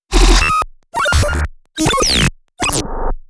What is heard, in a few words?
Glitch